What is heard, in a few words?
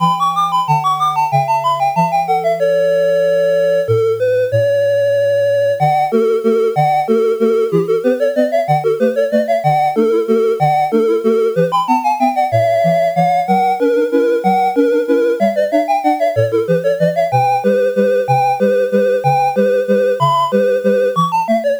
c64 hiscore; ghosts n goblins